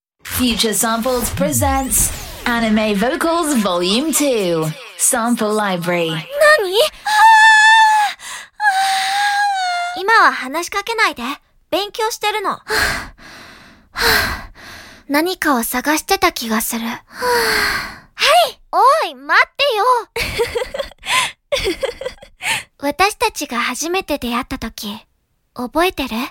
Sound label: ANIME VOCALS; Future Samples; Anime Vocals Vol 2; 动漫人声